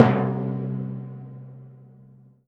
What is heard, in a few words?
Cartoon Timpani